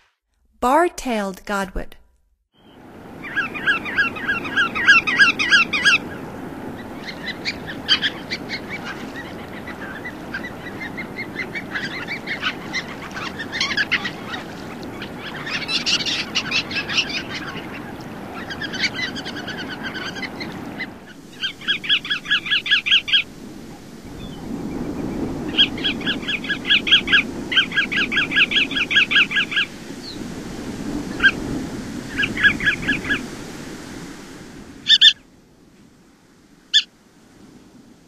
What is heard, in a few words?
Bar-tailed Godwit; North America; Stokes